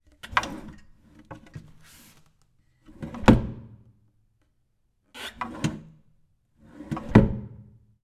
Household, Desk, Drawer, Slide, Open, Closing, Hotel, Vietnam; starbites